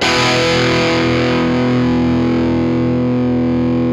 GRUNG SUS4, Guitar; 90 s Sample CDs; Quantum Leap - Guitar & Bass

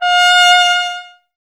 BRS TP, Trumpet; Miroslav Vitous

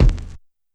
BD Break; YAMAHA RM1X

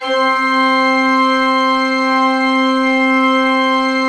C3, Cathedral Organ, MANUAL PLENO; Propeller Island

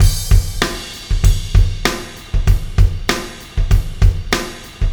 Beat, Loops

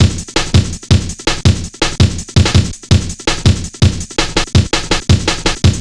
Drumloops, 165bpm; Drum Bass; Zero-G; Total Drum Bass

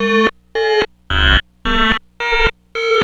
ALIEN SKAPE; Rubber Chicken Software Sample Bank

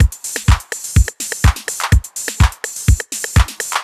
drum loop transit full 125, Classic Chicago House; Sample Magic; Drum Loops

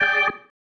Stab One Shots, Organ

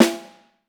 SN1FF, orchestra hits; Best Service; Peter Siedlaczek's Advanced Orchestra

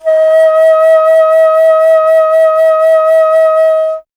Alto Flute, Solo Instruments; Miroslav Vitous - Solo Instruments VOL-2

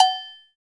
AGOGO LO, Latin; Roland L-CD701; PRC Latin 4

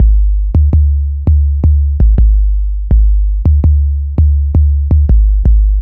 bass loop 165bpm Dm, Drum&Bass; Total Drum&Bass

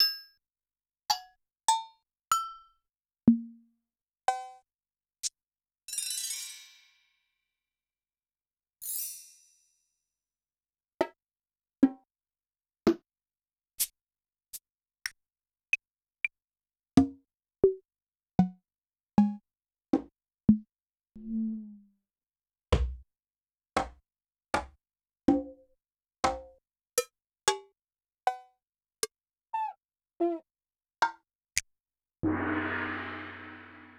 Yamaha DTXpress-II Perc

